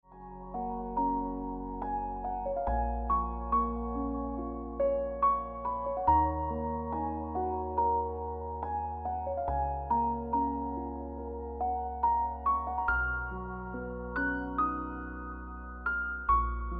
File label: Ringtone